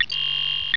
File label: restoreup; windows events